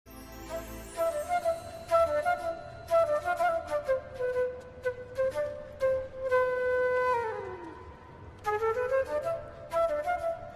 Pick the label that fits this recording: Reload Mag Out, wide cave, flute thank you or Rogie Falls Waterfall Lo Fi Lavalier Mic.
flute thank you